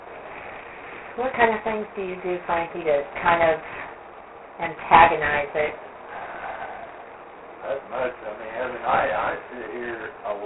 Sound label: sigh